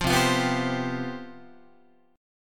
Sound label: C Major 7th Suspended 4th, C, down, Guitar; strummed